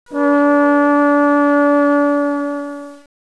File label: alphorn